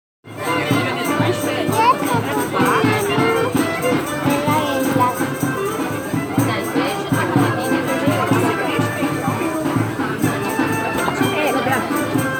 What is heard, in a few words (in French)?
slovénie marché